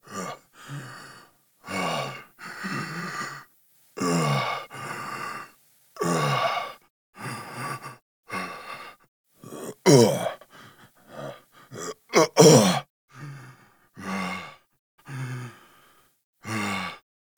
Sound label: Breaths; Kahl; Warframe; The New War; Cinematics; Grineer Eidolon Ending